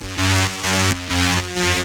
High Tearout

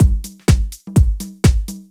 DEEPHOUSE BEATS 125

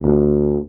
TUBA STRA D1, Tuba Straight; Orchestral; DirectWave; Varazdin Orchestral

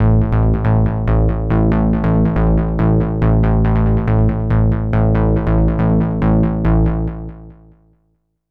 HARD BASS 140 A, Hard Trance, Bass

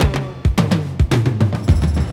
full fill 106, drum; BREAKS

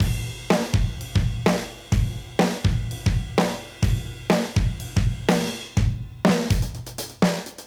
Modern Breaks; Touch Loops; ClassicBreakSessions

